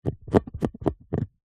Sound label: sport netball ball rub scratch mark off finger; zapsplat